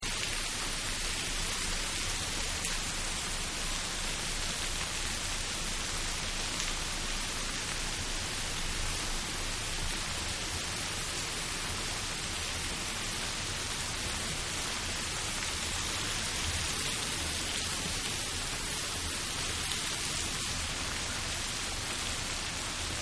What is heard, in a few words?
Rain Loop, Rain Loop, Splashy And Loud; Weather